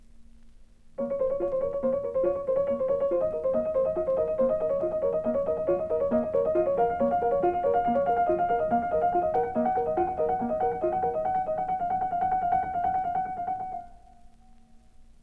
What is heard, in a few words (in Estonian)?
Improvisatsioonid